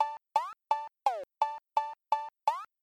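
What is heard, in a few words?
Cow Bell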